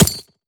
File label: frag physics bounce gravel hard; gmod; viper